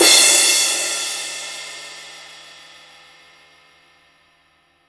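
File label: cymbal, Real Drum Kit, ROCK KIT; Best Service ProSamples vol.43 - Real Drum Kits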